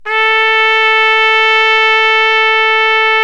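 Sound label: BRA TRUMPE, trumpet, brass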